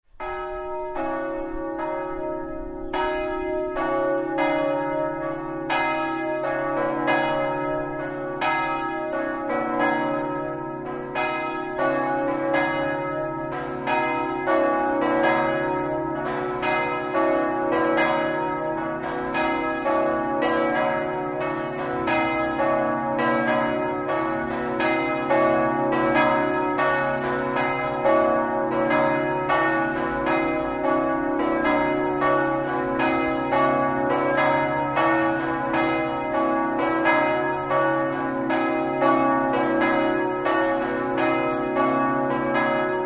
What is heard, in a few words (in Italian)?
campane; remanzacco